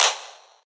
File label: subtle reverb trap clap sound a key